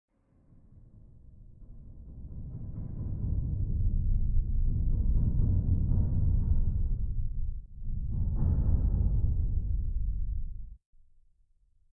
Deep Rattle; war trains 2019 gamerip

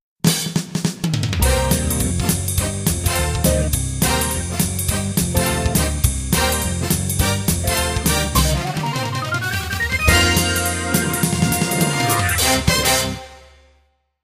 Jingle Level start; Neighbours from Hell

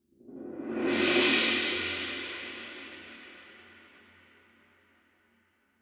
cymbalrush 172bpm; chicory a colorful tale; ps4

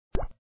grab topping; papa s freezeria gamerip

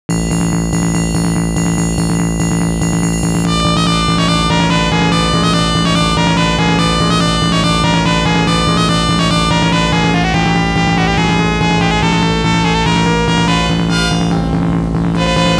BOSS BGM; Makaimura; Ongaku Taizen

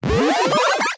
Power-up; android; mobile; gamerip; syobon action halloween edition

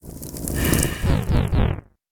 Shrine Light Turn Off

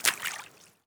warrior walk water

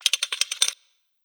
month change

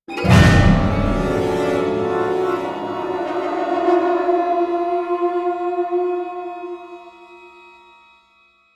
Stinger Drama; Dishonored

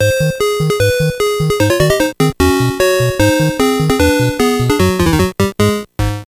Game Over; Normal Game (Retro); bomberman party edition

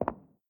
carpet footstep; adams venture origins

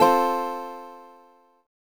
Guitar Strum; the legend of zelda phantom hourglass; Freedle

